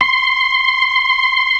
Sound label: ORGAN, 4 DRAWBARS; Keith Emerson Signature Series